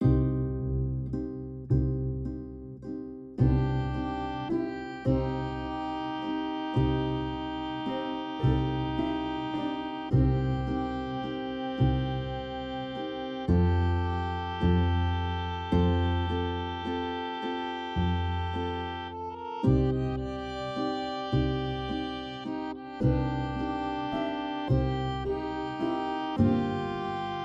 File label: Blues by the Campfire; music for picture